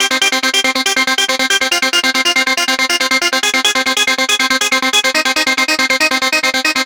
NRG Arp, trance

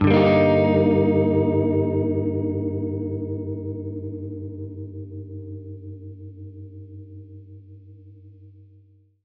Gretsch Chord Gminb6, Guitar Chords; undercover samples

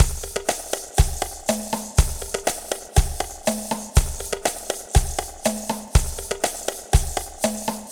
Cha Cha Cha 121, Lounge Drumz

